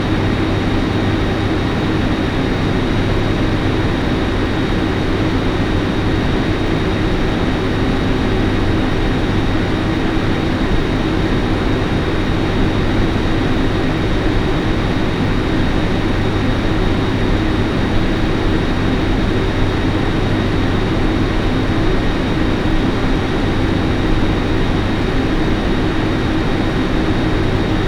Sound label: helix fan noise, lenovo